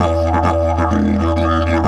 E 130, didgeridoo

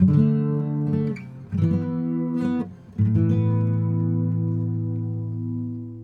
Sarangi Resolve, Acoustic String; Sonic Foundry (Sony Creative Software); Friendly Fire

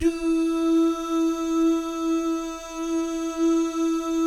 DOO GUYS, Vocal Jazz FX; Spectrasonics; Vocal Planet; JAZZCOMBO

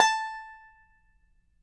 banjo A5, banjo notes; Sampleheads - Whole Lotta Country 1 & 2

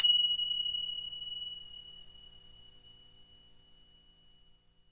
FINGER CY L, Synclavier Percussion; ILIO; Synclavier Percussion 2; World & Orchestral

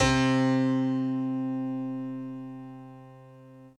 SY99 Piano C3; Total Drum&Bass

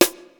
GROOVE KIT, ENSONIQ TS-10; Rubber Chicken Software Sample Bank

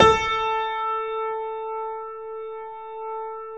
GRANDP ST A 3 F; USB Soundscan vol.17

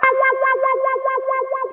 GUITAR

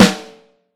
Vintage Snare Drum; Q Up Arts; Johnny.C's Vintage Snare Drum; 7X14

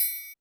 Percussion, Korg 01W; 300 Drum Machines

